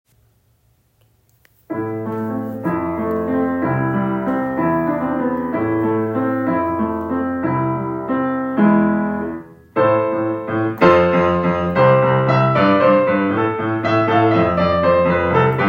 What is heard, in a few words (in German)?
Klavier; Haus der Musik